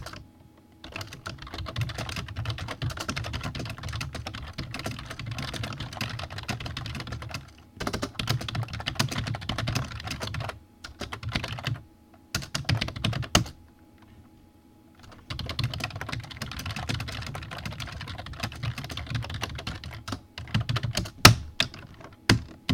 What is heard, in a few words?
keyboard